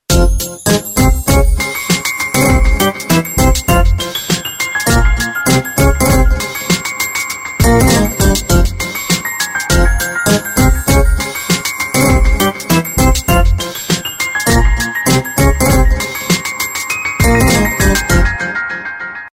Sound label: ringtone; Gionee; GN305